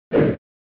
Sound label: SNES Fighting Swing